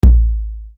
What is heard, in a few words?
Bass Dr, EuroDance Bdrums